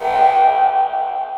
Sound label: Sonar; 3DShooting